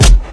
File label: flesh strider impact bullet; Source Engine; video game